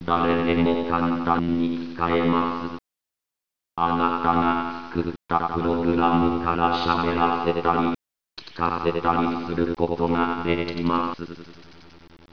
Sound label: robot